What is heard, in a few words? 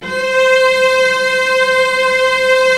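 strings, mf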